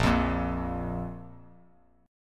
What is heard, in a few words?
A sharp 5th; strummed; JGuitar